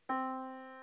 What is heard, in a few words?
Yamaha Disk, piano sound